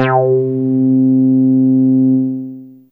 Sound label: Bass Zow C4, Analog; Fairlight CMI IIe